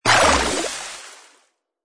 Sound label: puddle